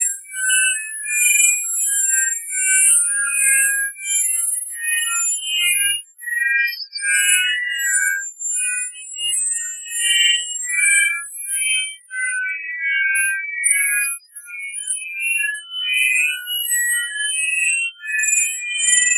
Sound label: Weird Alien Noises